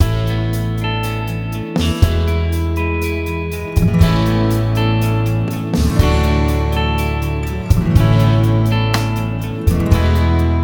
add ac. gtr, telecaster

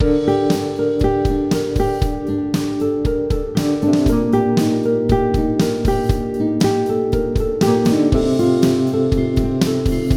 no cello

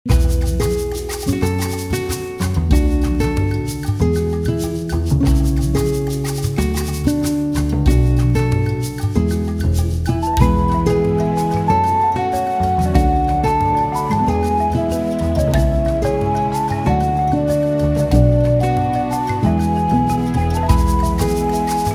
Folk Tradition

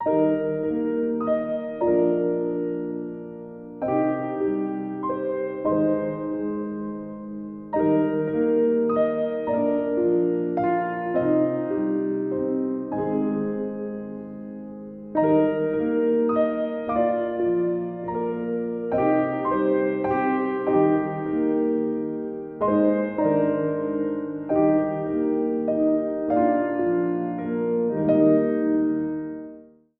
no cello

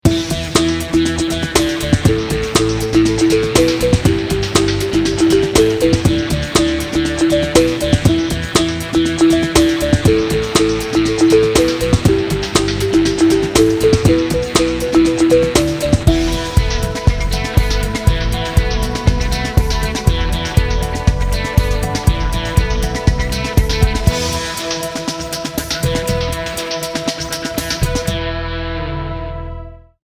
Energize Alt Gtr

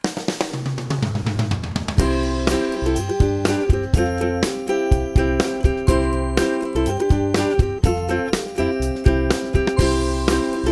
Uke Groove

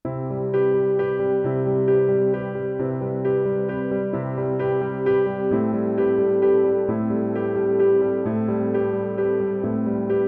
Piano Dreams

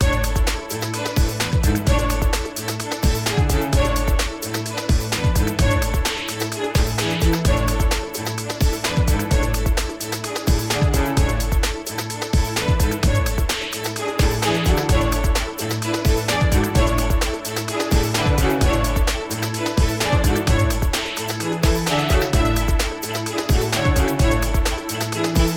Perpetual Strings

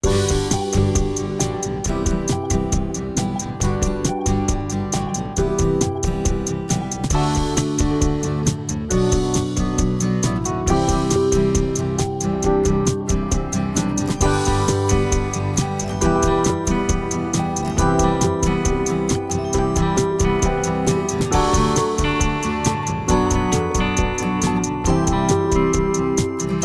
(no melody)

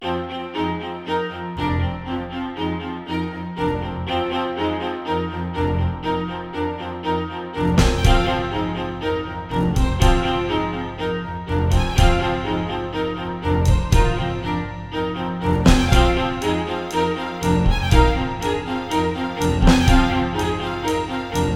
String Groove

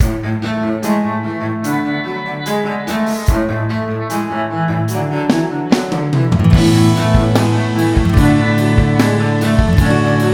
Cello

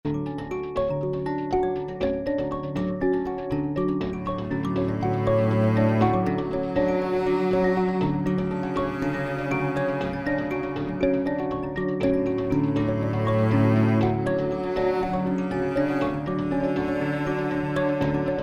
Cello Chillin (no drums)

